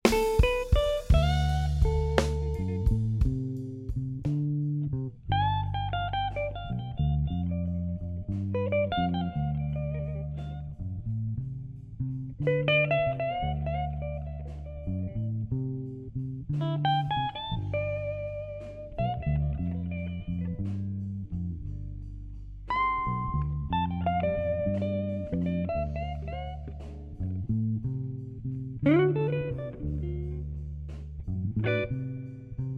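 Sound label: slow blues play along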